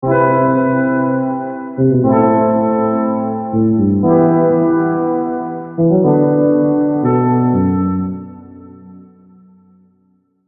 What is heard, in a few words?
Pad; Again; Unison Billboard "Hot 100" One-Shot Collection